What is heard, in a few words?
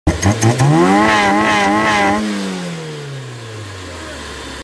Ram air; کیت مکش